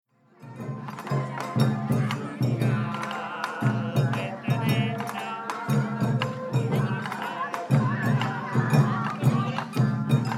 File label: bon dance